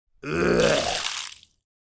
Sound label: Person vomiting on cement